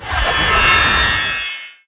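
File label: skill ice bolt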